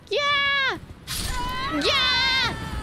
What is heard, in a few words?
gyaaa gyaaaaaaa; IRyScream